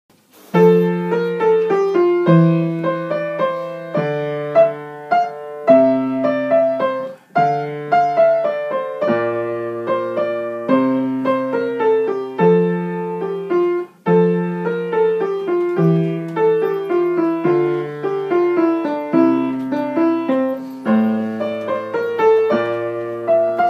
french menuet

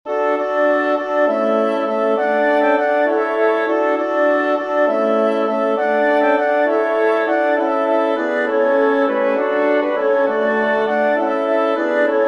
for woodwind quintet; Carnaval Venise

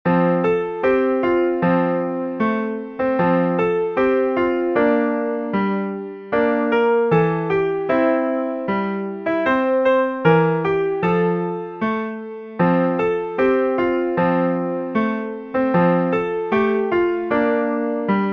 jingle bells; pierpont james; vive vent

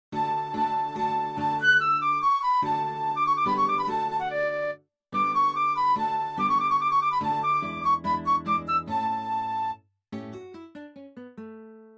adagio; Call, Leonhard von

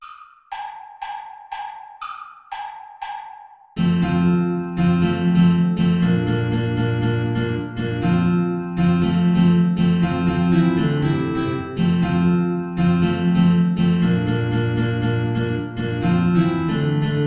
traditional play along without oboe

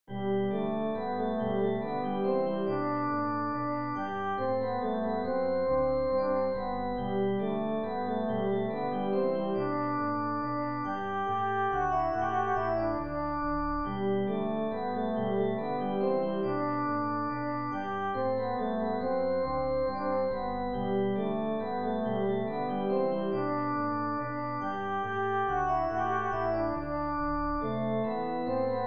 gavotte sol; graupner christoph

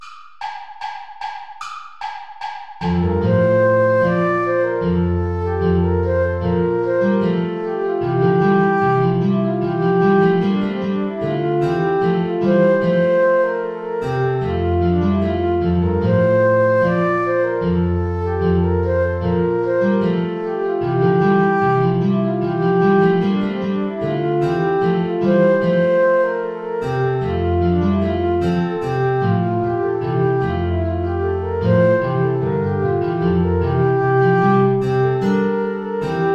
play along without guitar; praetorius michael; ballet des baccanales

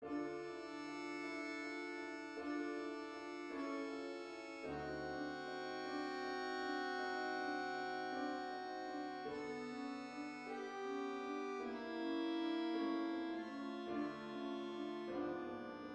song without words; rachmaninoff sergei

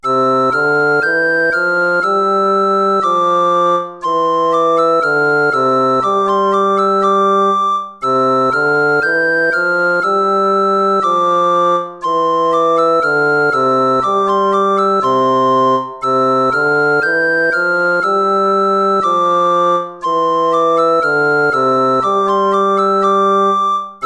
gavotta; hook james

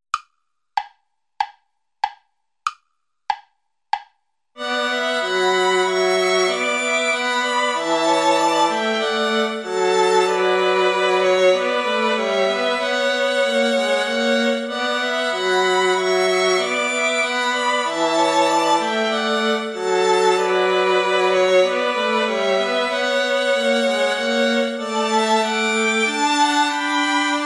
play along without cello

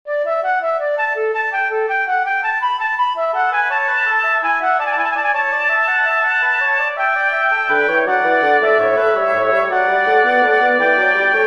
fugue major for woodwind trio; bach johann sebastian

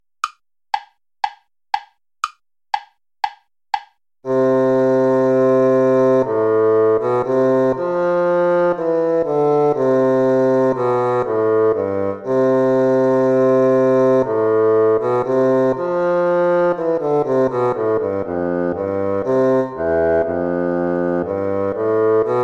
ode joy play along; beethoven ludwig van

